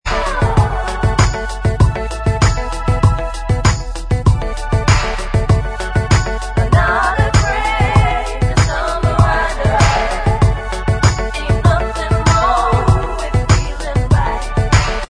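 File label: Bvox Track, backing vocals track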